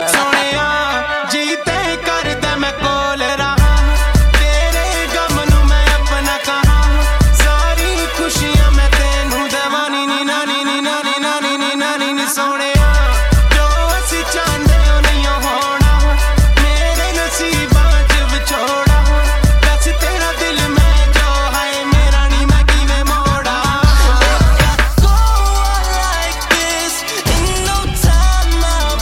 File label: Hindi Tone